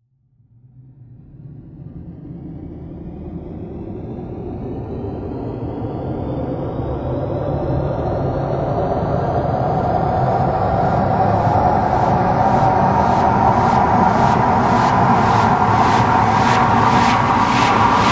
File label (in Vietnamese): Âm thanh nền bầu không khí bí ẩn căng thẳng